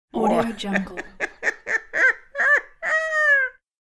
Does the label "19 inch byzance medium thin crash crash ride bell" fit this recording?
No